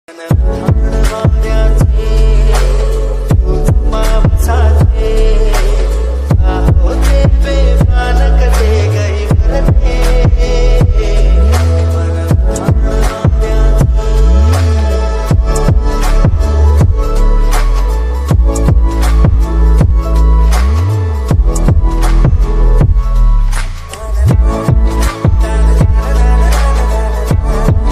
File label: song lofi flip ringtone bgm tune